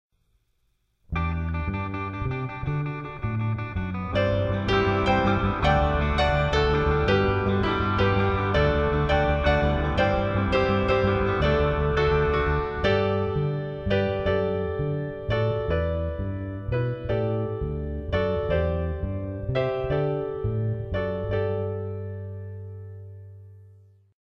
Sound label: Instrumental